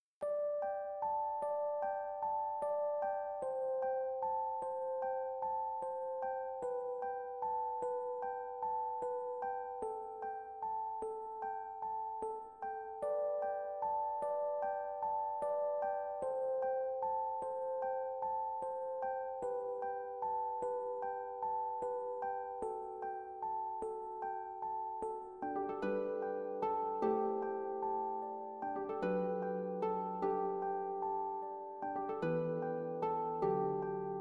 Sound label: meditation BGM